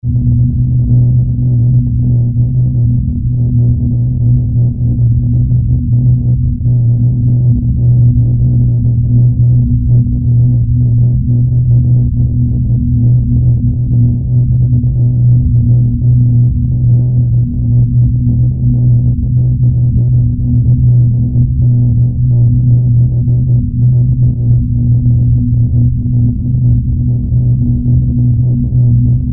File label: ambi; cstrike